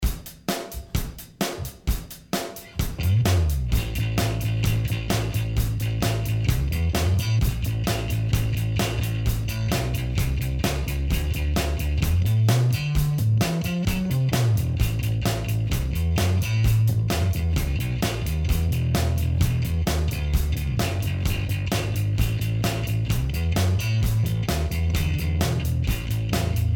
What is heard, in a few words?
Fuzz; Fclef 5 string Vintage ‘60